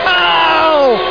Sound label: OWWW; BigMax nº 7; SENNA